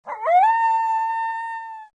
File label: angelo howl